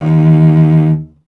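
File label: strings cello D#; Miroslav Vitous; Solo Instruments VOL-1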